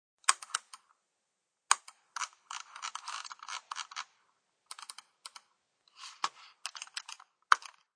Clicks of a computer mouse; Computers; Electronics

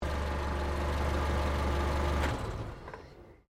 CAR SUBARU LIBERTY EXTERIOR SHUTDOWN; Car Subaru Liberty 20 01; Family members Subaru; Cars (Sound Effects:Vehicles)

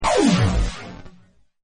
BLAST, Button; Buttons; Sound Effects:Multimedia; Partners In Rhyme

